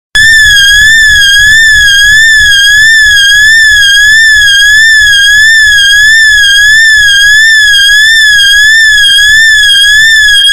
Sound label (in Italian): sirena evacuazione